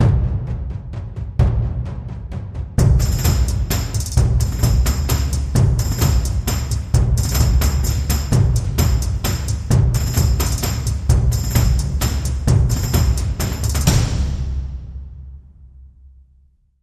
Tribal Spirit

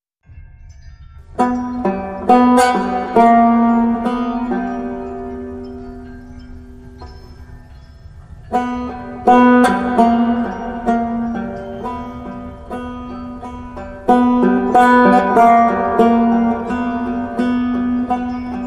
Suspense

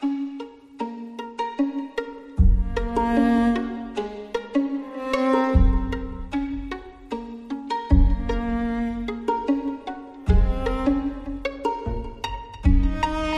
Stringing, No Drums

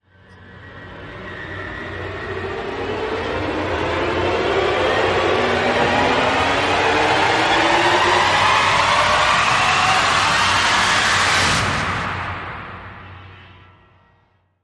Rise

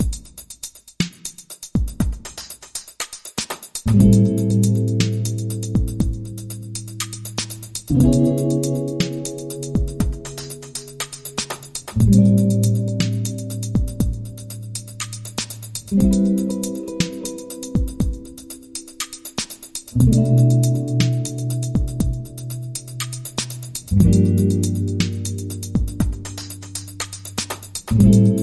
Sad Song

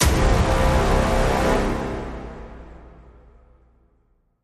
Stab Big Brass (C)

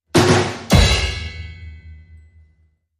Vaudeville Drum Set

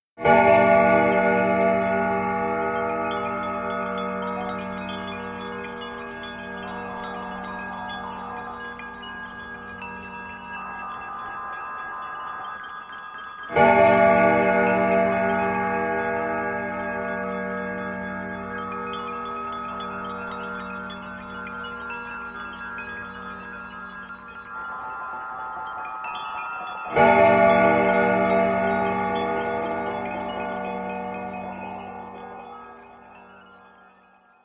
Bell

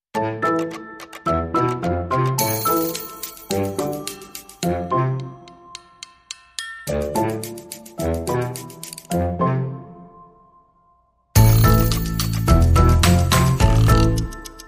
Emerging into the Light, Underscore

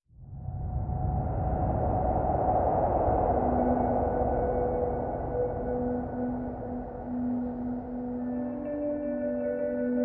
No Vocals and No Perc

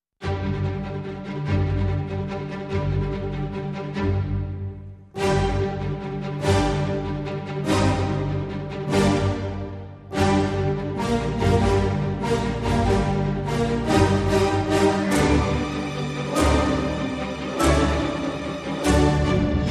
No Percussion

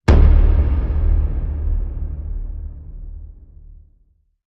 Hit Big Final Blow

